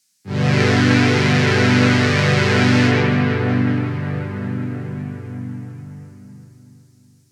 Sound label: Synth Pad; j106